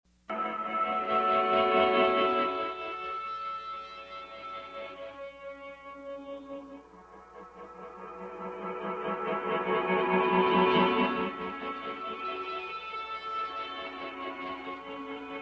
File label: sax reverse tape effects